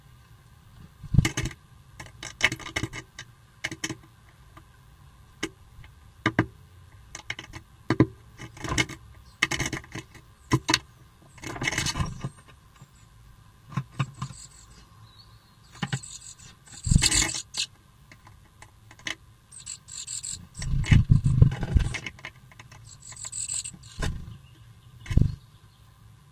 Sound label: feeding, nestbox